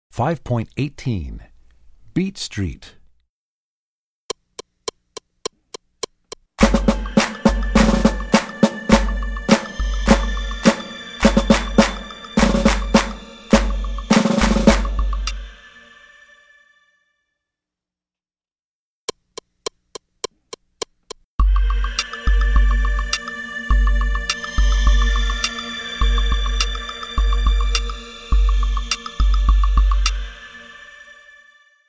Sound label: Beat Street, Percussion; Percussion Book 1